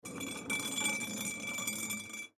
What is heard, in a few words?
Lazy Susan Marble, Lazy Susan Marble Rotate Glass Bottles On Top; Household; Kitchen